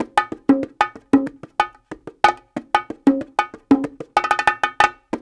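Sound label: 93 bpm, Timbale Bash; Spectrasonics; Supreme Beats; African Contemporary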